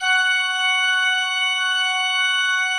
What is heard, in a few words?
F4, Cathedral Organ; ILIO; Propeller Islands